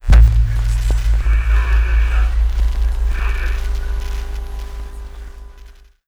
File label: encrypted comms; Stellaris; nemesis